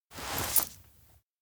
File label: collar repair done; anemoi